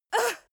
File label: female moan pain short soft; soundpool